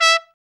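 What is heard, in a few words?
BRA TRUMP, trumpet, brass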